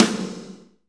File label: SNR, Drumscapes Roland